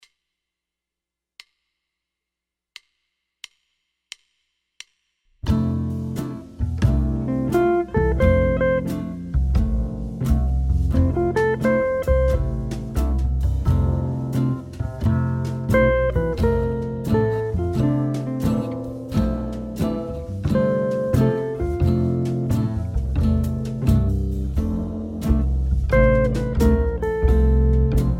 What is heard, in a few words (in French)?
Playback, guitare jazz